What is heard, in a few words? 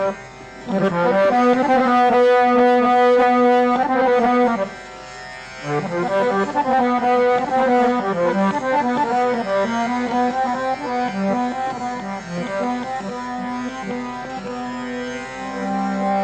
Thumri Tilang Harmonium